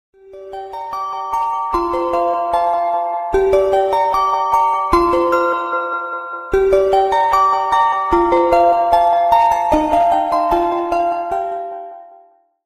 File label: musical alarm ringtone; android